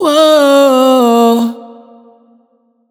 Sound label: riff G# wet, Vocal Sample Pack, Raw Vocals, Wet Vocals; Slate Digital Omnivox Vocal Sample Pack